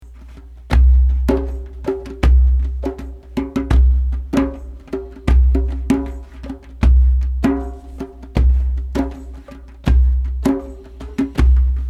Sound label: Drum Med for Beginners; Drumming Meditation Introduction